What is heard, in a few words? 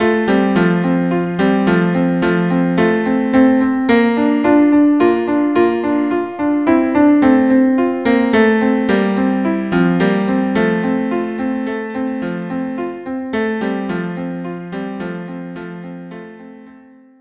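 viola cello; Believe Me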